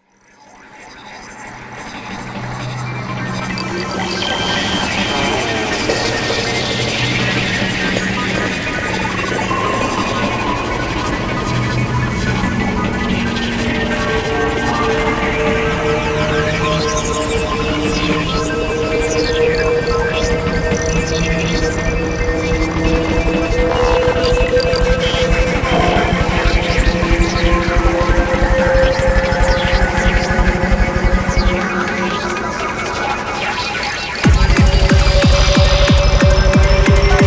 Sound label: cauldron; wizards